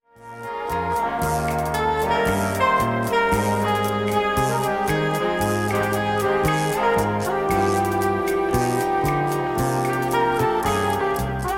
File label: Slow Bossa; teaching listening